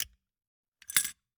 Gumball machine, candy door, plastic, open, drop, hyper; Eiravaein Works; Vaeyan IV